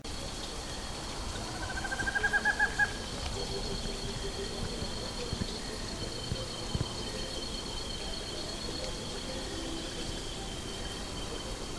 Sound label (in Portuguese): corujinha-do-mato, aves